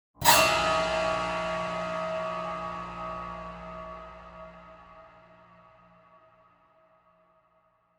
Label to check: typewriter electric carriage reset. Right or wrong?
Wrong